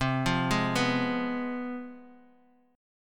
C Major 7th Suspended 4th Sharp 5th, C, down, Bass Standard; CM7sus4#5 arpeggiated; JGuitar